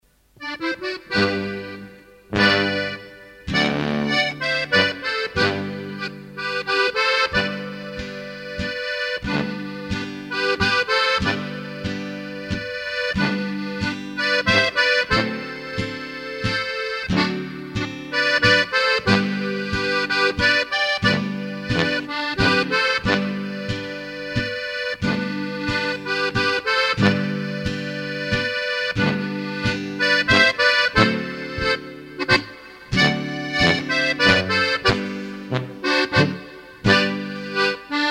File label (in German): Plattler; Schlechinger